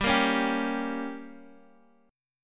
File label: G Diminished G, Guitar Open D; down